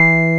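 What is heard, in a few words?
analog electric piano E2, analog synth; AKAI S6000 CD-ROM - Volume 1; SAMPLE WAVE MIXING 1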